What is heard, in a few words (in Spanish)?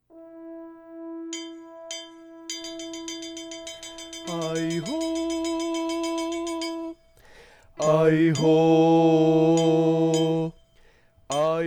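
ay ho bajos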